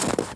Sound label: player snow; firearms